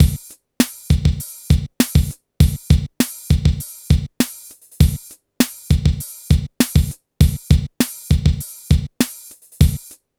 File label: HOUSE; INIS; Funky Xtreams